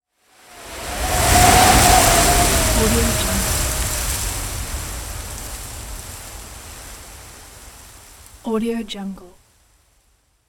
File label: heavy wind gust